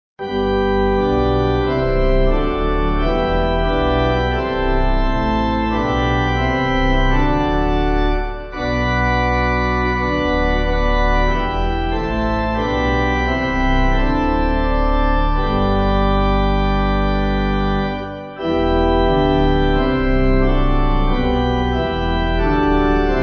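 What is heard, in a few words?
Pipe, pipe organ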